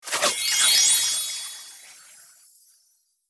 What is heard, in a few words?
Magical sparkle transition; shorts sound effects